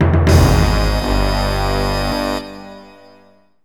Fail; PS2

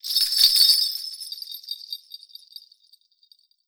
Psycho Imp Bells

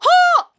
Cloth Combat Attack; gamerip; Hk

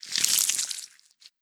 Bolt Move

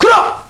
Japanese Shout; Mars Sortie

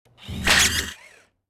Mining

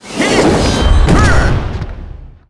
ArcWright skill

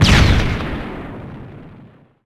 Pickup Shockwave

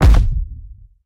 Animations Install Large

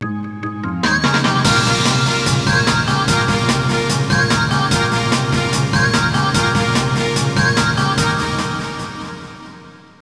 Str G#

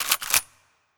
Aerobot Shot Reload; Legend of Keepers

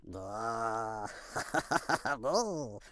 AMB China 3D Amb; Rabbids Multiverse

